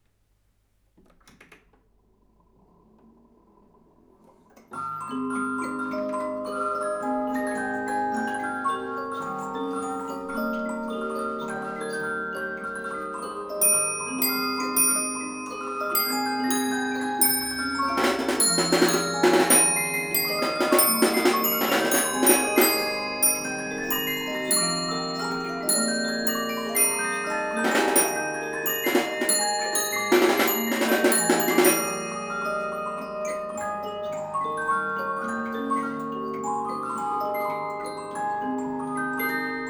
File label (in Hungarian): zenedoboz hengeres 6 dallamú svájc